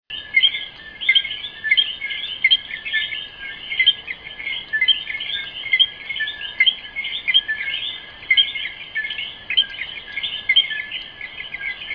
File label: eleutherodactylus antillensis carolina puerto rico; basic two-part call; much faster and hollower in quality, / a series of quick "ko-KEH"; West Indian